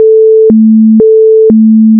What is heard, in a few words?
alarm; Solar Eclipse Viewer